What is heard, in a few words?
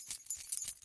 Glassjingle, glass jingle; Friday the 13th: Killer Puzzle